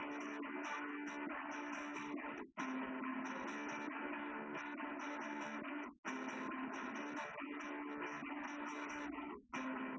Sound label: wdrums liverec; SiSEC08; SiSEC underdetermined